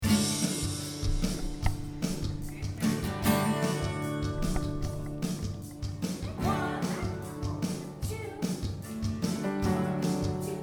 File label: Surprise Birthday Party; Jack River School songs